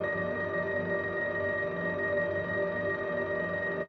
pad; M8

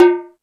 Percussion, Drum Machines E-MU Pro-Cussion

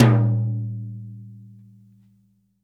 LUD12 BRUS, BRUSHES2 KIT; Big Fish Audio; Ross Garfield Drums VOL-2

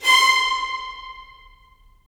Violins; Roland - LA Composer vol. 4; Violins Sh

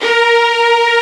Section String A#3; Proteus 2000; Digital Sound Factory Proteus 2000

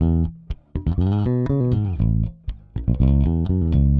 Pop, Bass

